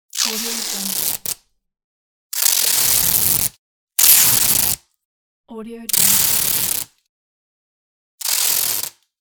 long scotch tape

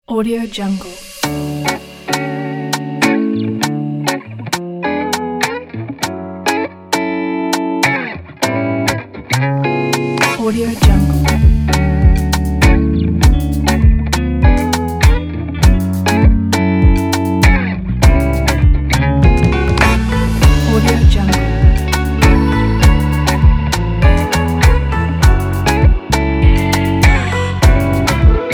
afrobeat sweet

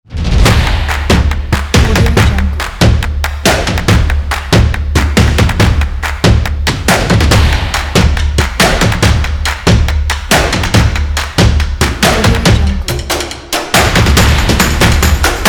percussion

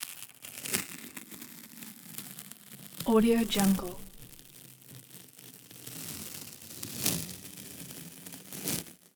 duct tape peel

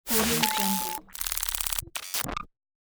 moving machinery sequence

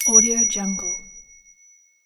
ding crystal; nektar pro

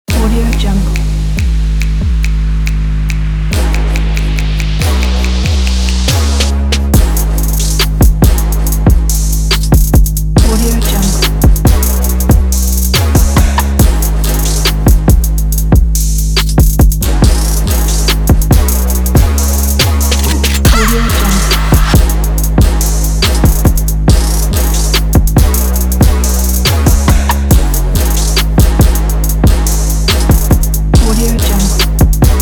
trap

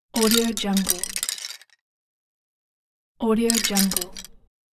scatter bones

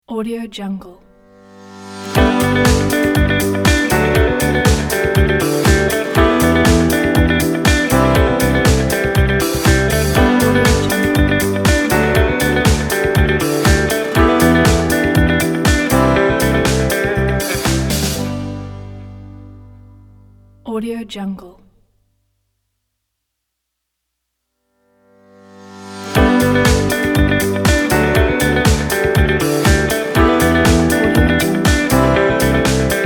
upbeat corporate logo

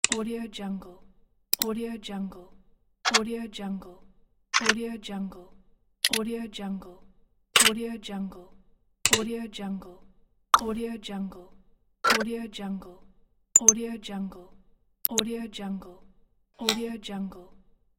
click

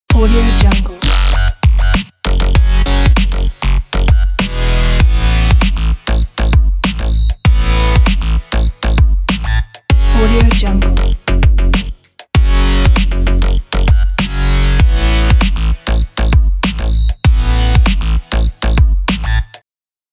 breaks loops pack

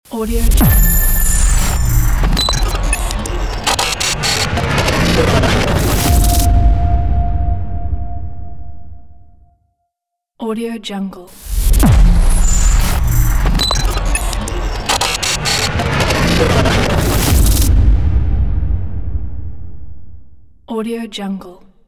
glitch interface technology logo ident

